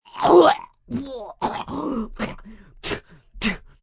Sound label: Vomit Spitting, Vomitting, male/female, coughing, spitting; human voice; Vomiting; VoiceBox